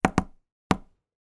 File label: office ballpoint pen tap hard paper